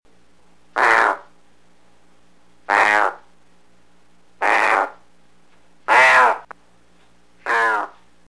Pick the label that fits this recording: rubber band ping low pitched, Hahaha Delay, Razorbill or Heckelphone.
Razorbill